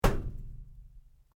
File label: METAL CORRUGATED IRON PIPE LARGE STRIKE